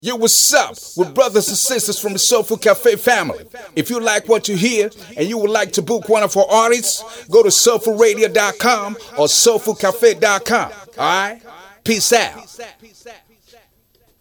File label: SOULFUL CAFE JINGLE